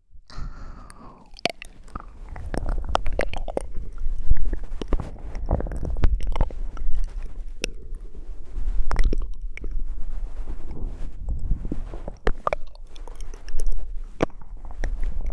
タオル耳舐めコース

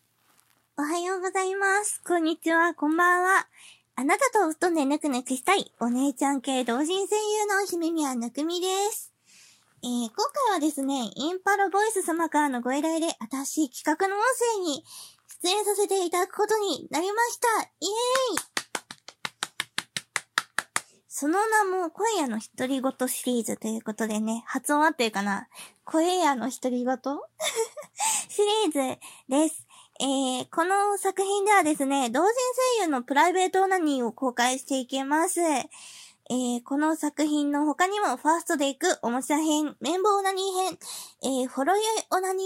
プライベートオナニー実演; 声屋のひとりごと

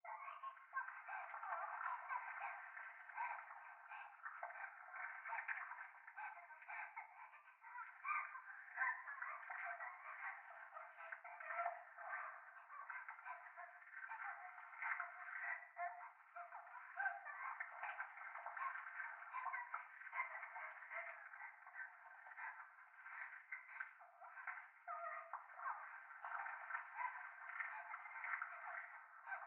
トイレ 可愛い喘ぎ 触手

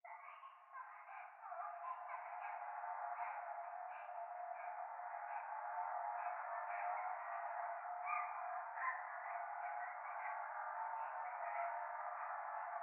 教会 可愛い喘ぎ 車の中

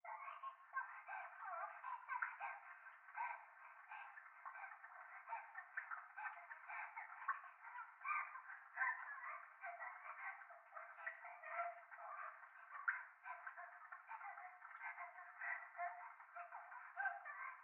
トイレ 可愛い喘ぎ 雨上がり

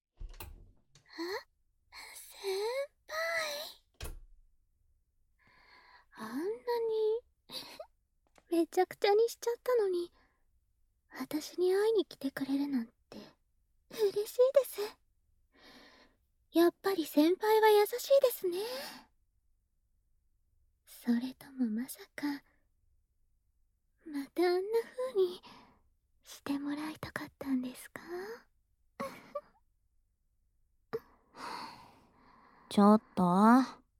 W耳舐め; 恋と沙奈のW耳舐め

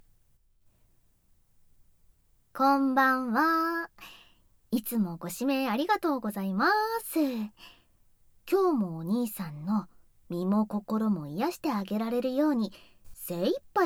耳舐め囁き裏オプえっち

